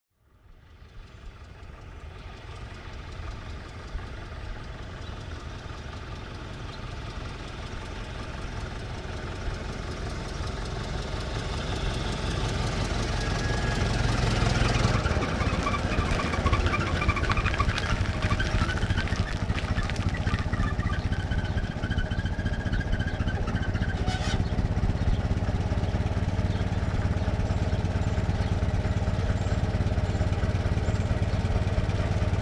1924 Ford Stake Bed Truck Ext In Slow Idle Off; Period Vehicles

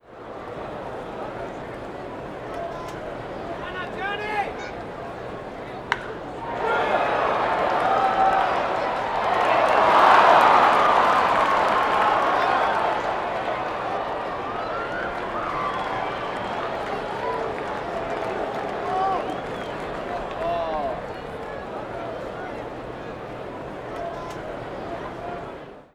Baseball Hit And Cheer; Sports & Recreation

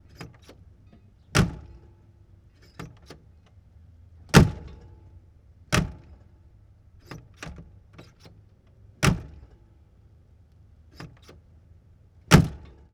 1954 Big Rig Door Open Close Various; Period Vehicles

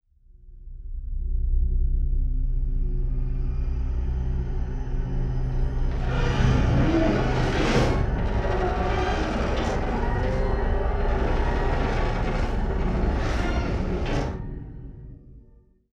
Eerie Synth Creak, Horror; Sound Design

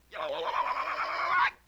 LoFi Synthetic Squawk; Vintage Cartoon; Comedy Vocals

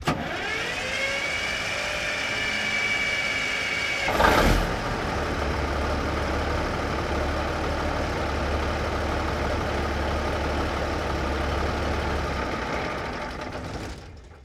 1954 Big Rig Ext Long Start Off; Period Vehicles

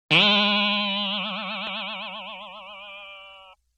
Boing Box Higher; Vintage Cartoon; Hits & Tones

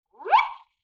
Wind Whistle Zip

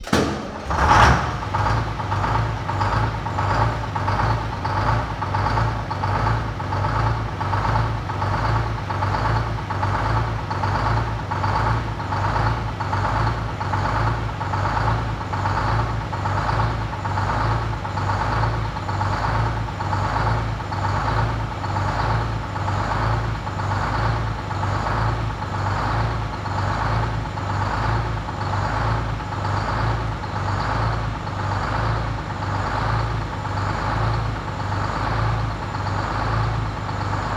1948 Diesel Truck Int Start Idle Revs; Period Vehicles

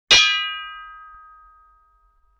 Brake Drum Hit High; Vintage Cartoon; Drums & Percussion

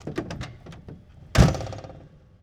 1948 Diesel Truck Door Open Close; Period Vehicles

